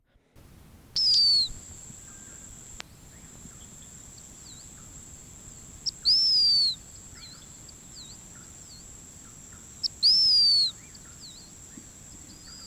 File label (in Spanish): Hydropsalis cayennensis Chotacabras coliblanco